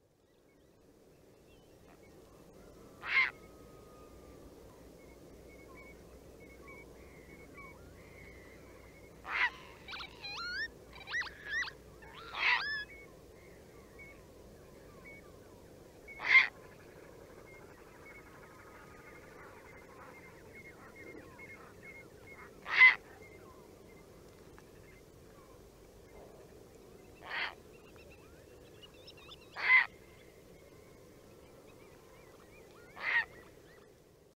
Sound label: Chant du heron cendre Song of the gray heron